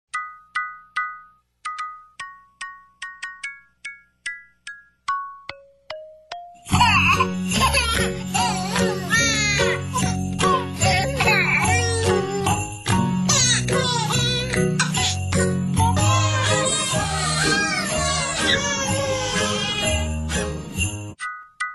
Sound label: jingling baby ringtone